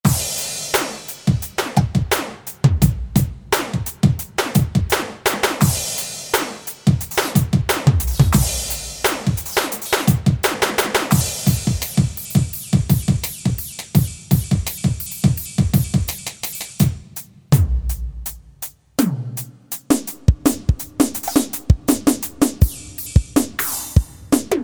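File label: drum wacky; E-mu Proteus FX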